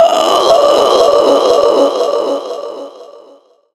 Siren Infected; zombie plague

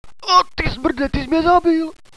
hostage death; cstrike

